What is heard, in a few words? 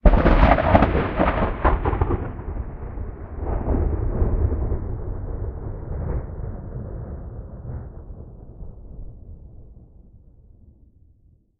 thunder clap rumble; stereo; Phasmophobia